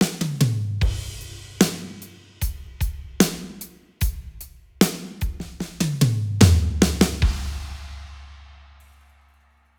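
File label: Black Vinyl, drums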